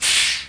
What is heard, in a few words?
brake, busses; WAV Sound Effects; transpo